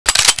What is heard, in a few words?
slide back; svencoop